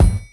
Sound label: Kick, Hip-Hop